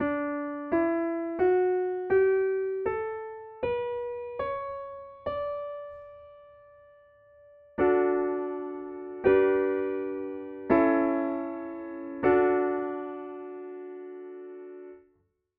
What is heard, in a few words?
15edo nice tone